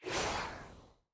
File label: pack death; naafiri